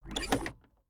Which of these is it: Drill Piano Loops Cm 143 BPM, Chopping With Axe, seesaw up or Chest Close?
Chest Close